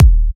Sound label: Bassdrum